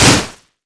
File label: glaive hit wall